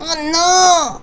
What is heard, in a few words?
Shuffle Stop; SuperFruitChutes; ClubFusion